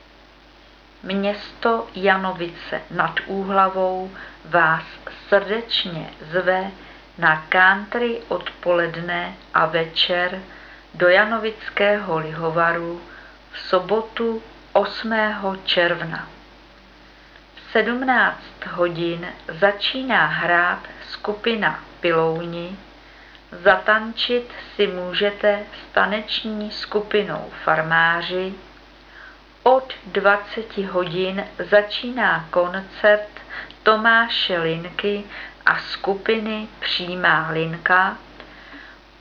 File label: country